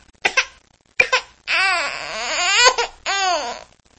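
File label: crying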